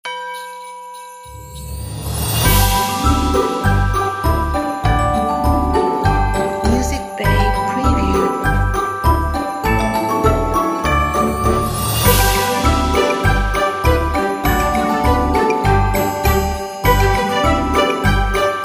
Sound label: Christmas Shopping